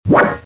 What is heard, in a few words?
Wave